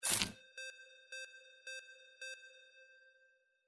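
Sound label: rollover reverse; windy beach audio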